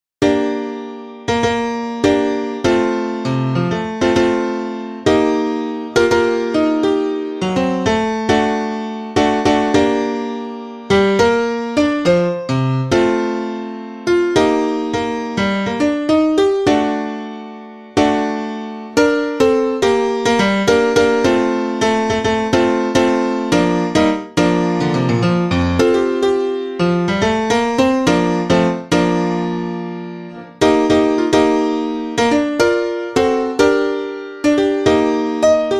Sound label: piano